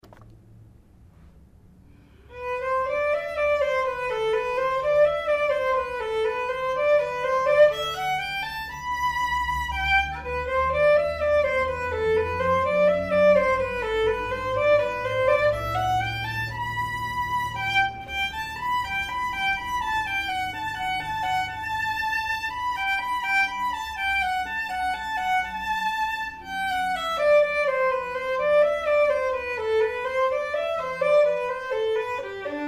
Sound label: perf vln